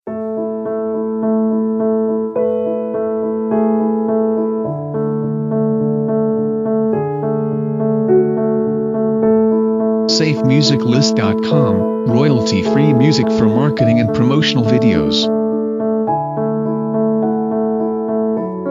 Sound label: Inspirational Piano Solo; SafeMusicList